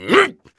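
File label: human middle male attack, voice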